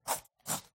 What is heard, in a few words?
refused